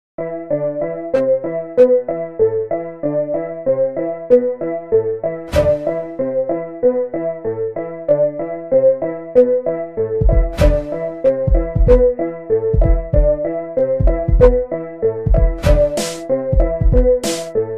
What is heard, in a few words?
FL Studios - My techno beat; Fat Bros Productions